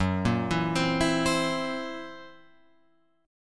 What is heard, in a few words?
F sharp 7th Flat 5th F sharp down Guitar Open F; arpeggiated; JGuitar